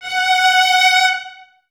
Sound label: Violins Detache F#5; Miroslav Vitous; String Ensembles